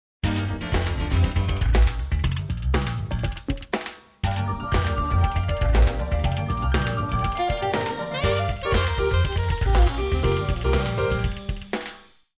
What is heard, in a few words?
music two slap echoes